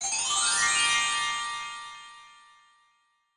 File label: Magic; mahouteq